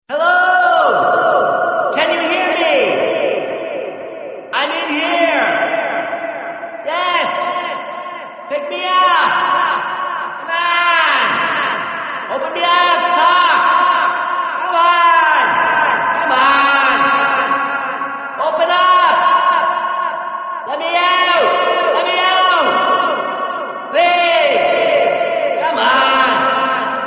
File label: Telephone In A Well